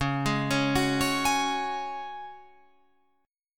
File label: Db Suspended 4th, Guitar, Open F; Dbsus4 arpeggiated; 13,15,13,13,16,15